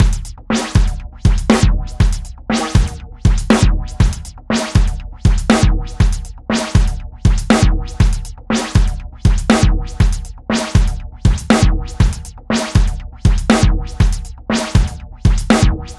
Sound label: stereo spaced lfo, moog filter; universal audio